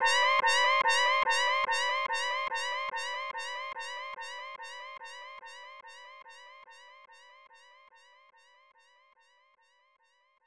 Error

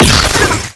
Swarm Shoot; Skylanders Cloud Patrol; Arcade, iOS, Mobile

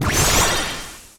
Enemy Twins Active Motion; disney star smash android ios mobile gamerip 2020

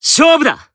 Warcry; Disney Star Smash; Android, iOS, Mobile; Emo

